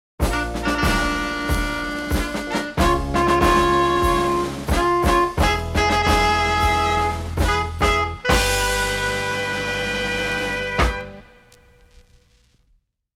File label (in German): Musikeffekte Akkorde